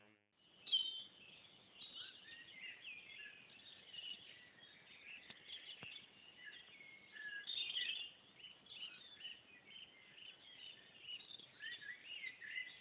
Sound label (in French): chant des oiseaux à l'aube; équinoxe de printemps; Céline Mallet